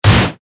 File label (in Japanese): ダメージを受ける; モノラル